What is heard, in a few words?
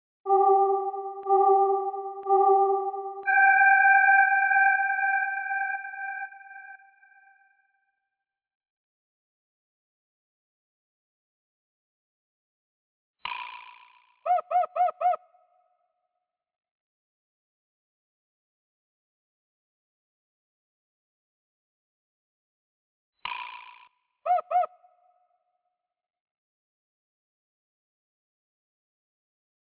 Chimer test